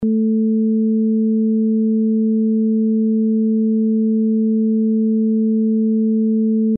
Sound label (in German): Akustik Interferenz